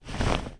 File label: bed